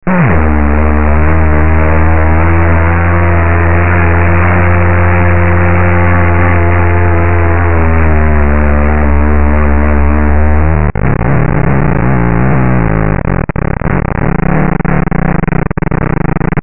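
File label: vocal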